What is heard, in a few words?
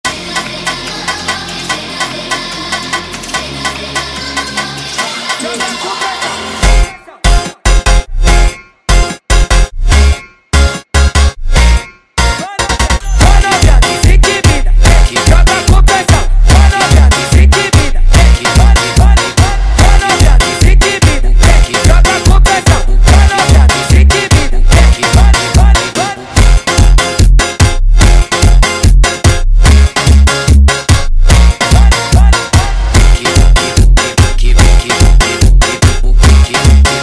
Funk sped Up